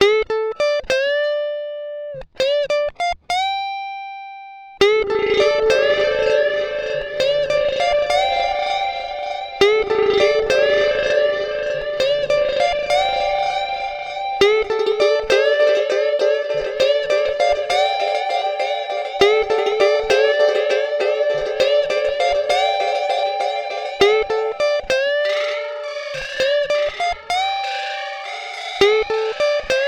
Electric Guitar

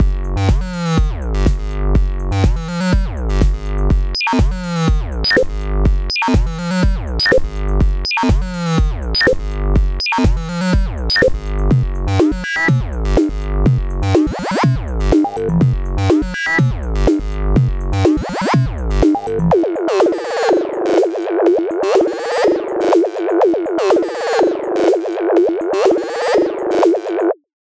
Minimal Loop; Shift

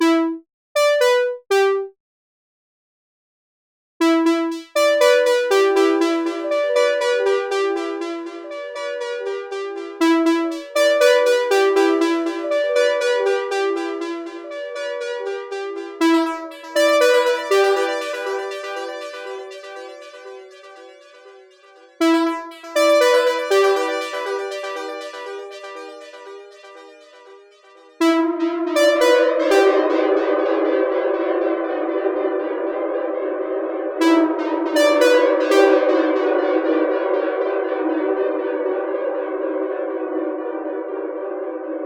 Stream on Mono Synth

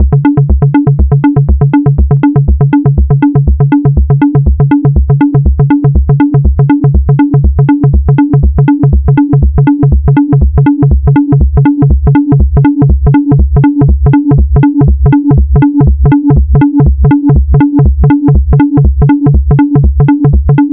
Analog Arpeggio; Reshuffle

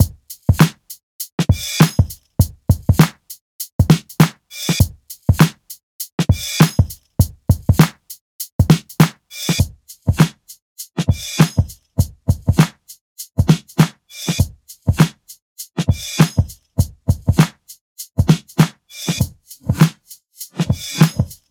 Acoustic Drums; Vague

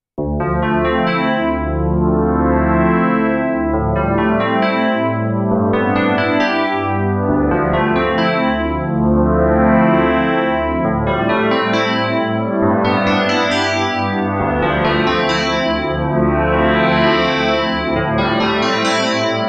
Turbo Tines; KORG Turbo

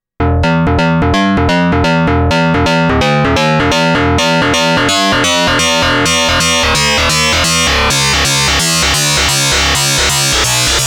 KORG Node; Cold Core

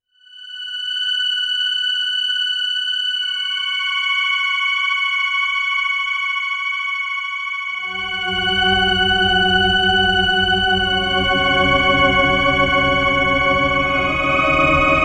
KORG Finite Airy Pad

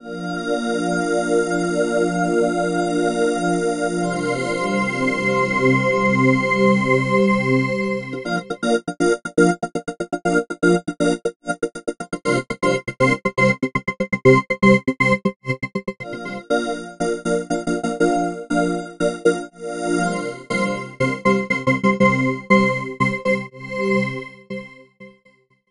Synth Pad; Inertia

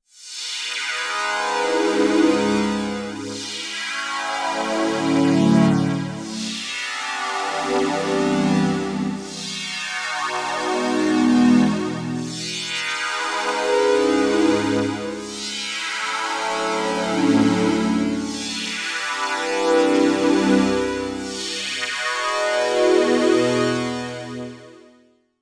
KORG Odds Static Virtual Analog

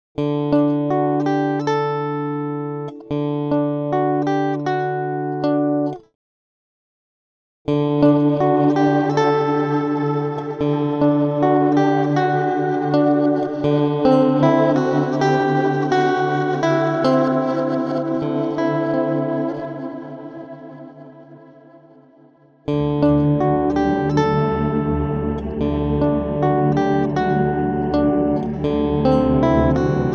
Electric Guitar; Albedo

